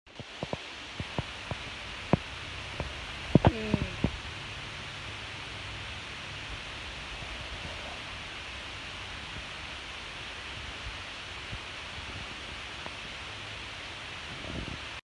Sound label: Gas meter; کنتور گاز